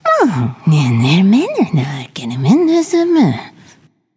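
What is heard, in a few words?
grumble, female; smite; voicepack; hera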